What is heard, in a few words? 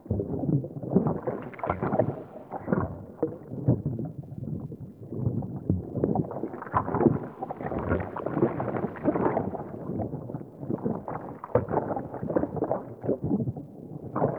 Blood Through Veins Loop; Warframe; Sacrifice quest